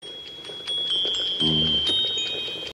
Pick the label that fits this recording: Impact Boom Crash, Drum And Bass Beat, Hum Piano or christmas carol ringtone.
christmas carol ringtone